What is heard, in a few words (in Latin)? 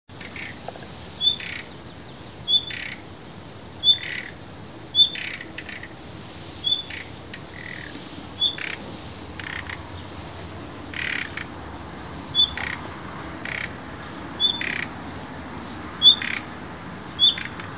Luscinia megarhynchos; SETE